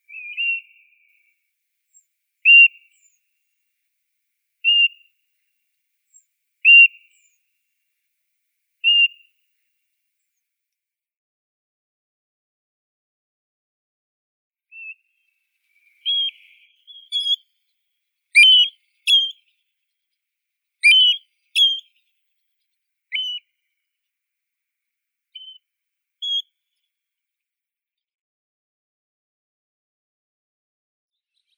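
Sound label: European golden plover, Pluvialis apricaria; rzhanka zolotistaya; zvuki prirodi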